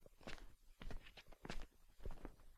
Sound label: Shoes walk carpet